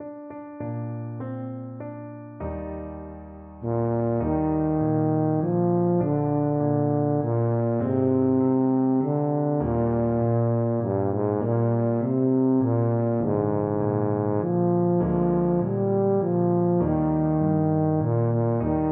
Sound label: bahay kubo, tuba